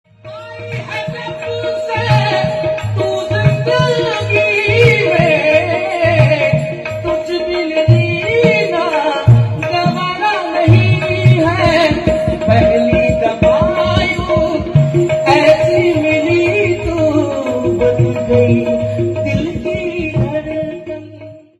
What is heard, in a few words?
Ringtone